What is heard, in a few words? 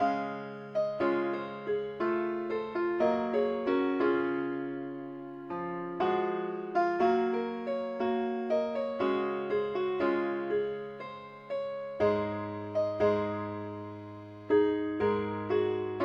keyboard only, key des; pilot